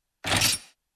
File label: Menu Click